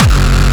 kickdrum; Kick Drums; lolicore

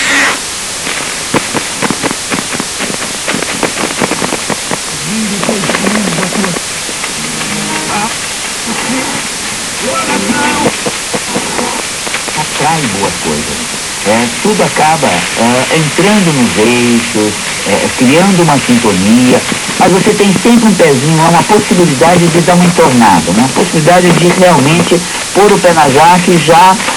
Chiptune; lolicore

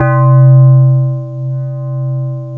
Tube FM; ESW Sphere

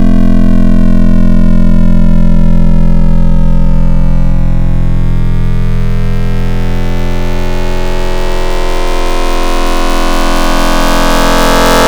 Fm, Wavetables; Xfer Serum; Ebola; Synthetic's Serum Collection; Cymatics - Nuclear for Xfer Serum Wavetables

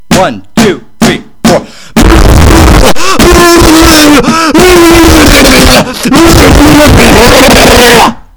Noisecore

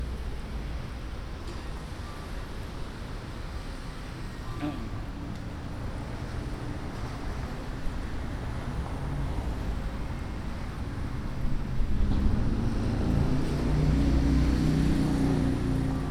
Construction Percussion

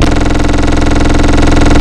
throaty; Wavetables; Synth One Shots; Synthetic's Serum Collection; ESW Core Wavetables; Echo Sound Works Core Tables

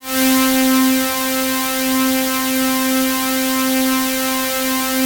Saw C4; Synthetic's Serum Collection; Aubit; ChainPop Vol. 1

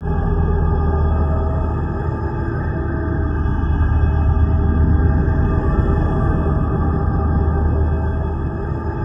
Noise Space; Synthetic's Serum Collection; ESW Ear Candy Noises

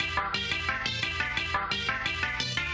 ravestab outro riff; 'Effection' samples; lolicore

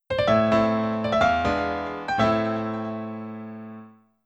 Sad Message; Wii Room (Theater Update) Soundtrack; Wii Channel

